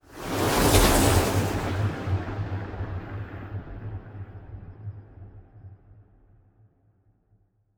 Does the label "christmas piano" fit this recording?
No